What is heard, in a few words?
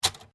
ADDING MACHINE SIEMANS ELECTRIC 1950S SINGLE KEY, Electric adding machine; Typing; Offices